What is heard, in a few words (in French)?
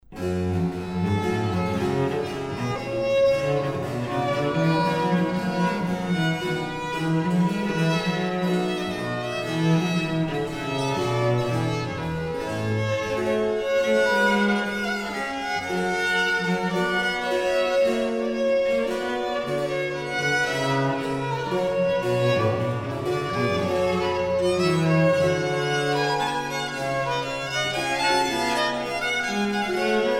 Prelude; Jupiter et Europe